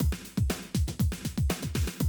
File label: 120, Classic Drum Loops; Best Service; XXL 1500 Classic DrumLoops; Sample CDs